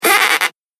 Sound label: Squeaky Balloon; Balloon Sound Effects; Foley Sound Effect; Andrew Fleming